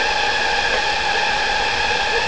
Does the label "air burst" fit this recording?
No